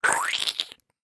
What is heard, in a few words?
splat; GGJ22